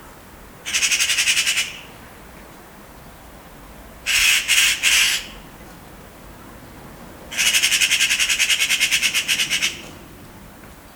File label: magpie caw, Magpie angry at a neighborhood cat.